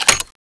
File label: clip out; tfa cso